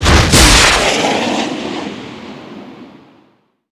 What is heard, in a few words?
f15missilefire; Call of Duty Modern Warfare